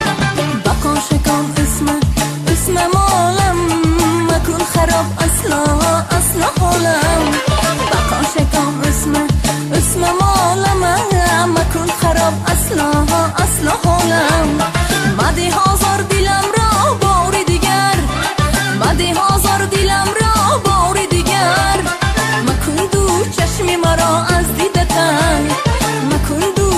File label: tajiki song